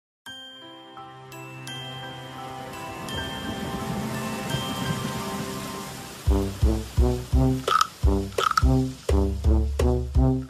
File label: rain Rain Go Away